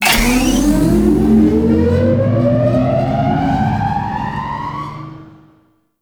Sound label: Thruster Ship Acceleration; Alternate Engines